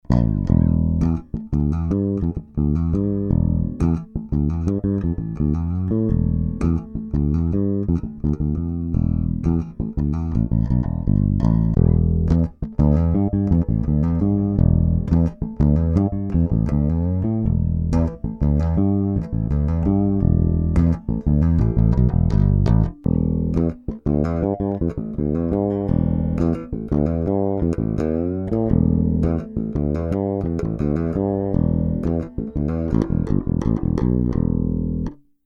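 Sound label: round Wound EQ flat, bass; Ltd; Beide PU; nur Hals PU; nur Bridge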